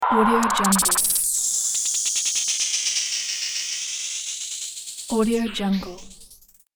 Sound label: glitch fx for media productions